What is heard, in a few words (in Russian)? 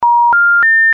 Указательный сигнал 1 раз